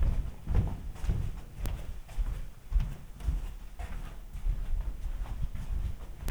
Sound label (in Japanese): aruku; 歩き; 絨毯(じゅうたん)の上(立体)